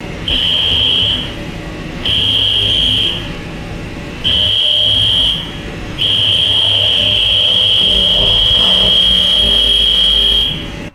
harsh industrial alarm buzzing over heavy rumble; alarms

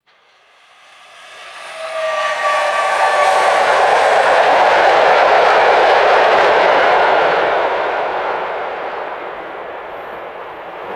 high speed electric train passing by; trains

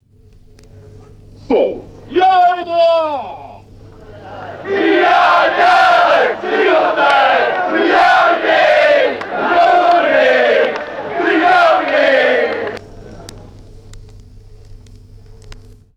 small crowd shouting hip hip hooray

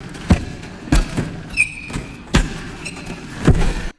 rhythmic jumping on a squeaky trampoline; tools

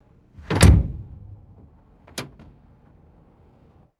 car hatch slamming shut

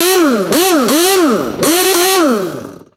pneumatic impact wrench ratcheting bursts; tools

